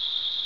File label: western cicadas